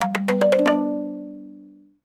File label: fast ascending kalimba strum ui sound